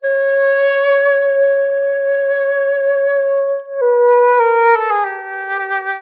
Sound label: flute, Loops, Sad